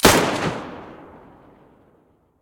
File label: mosin muzzle mono, weapons